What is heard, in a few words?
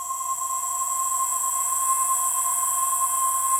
HOLLOW BLOW; Pro-Rec - Synthsonic